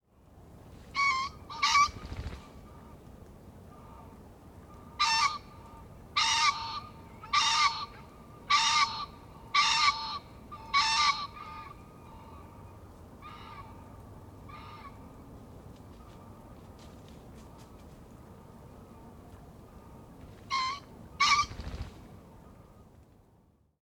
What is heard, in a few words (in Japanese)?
kiji horo